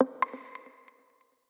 system notifications; Amazon Fire Phone

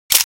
camera click; LG; G2x-P999DW

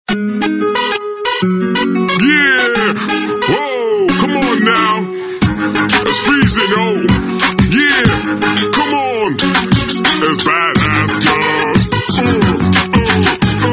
Hip hop Guy; Samsung; SGH-E251C

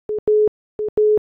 Alert on call; Samsung; Galaxy Beam GT-i8530

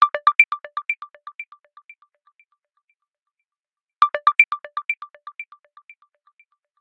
Fashion Ring, alarms; Gionee; S10

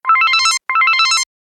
Provincial Synthetic, ringtones

